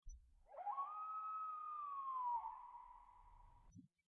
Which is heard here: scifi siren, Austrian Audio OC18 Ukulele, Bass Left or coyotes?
coyotes